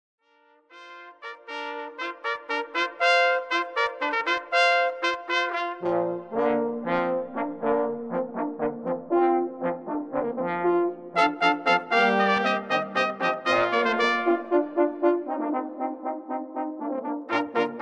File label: Brass Hornpipe